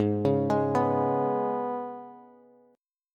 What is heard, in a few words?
Ab Minor Double Flat 5th down Bass; Abmbb5; arpeggiated